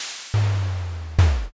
fridge

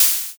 open hat

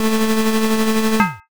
crane game

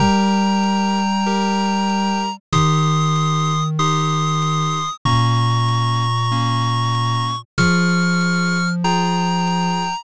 bgm mellow

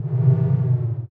booing

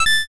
judge good

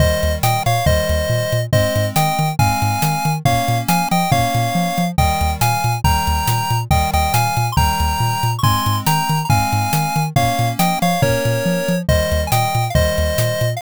bgm street

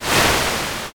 swoosh

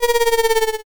sheep

